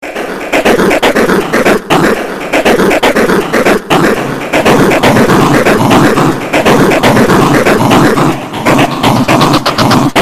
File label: grrr